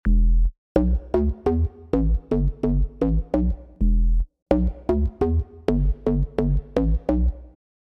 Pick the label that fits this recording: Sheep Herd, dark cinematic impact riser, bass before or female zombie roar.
bass before